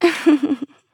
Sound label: Mage Laugh; Realm Royale; Technomancer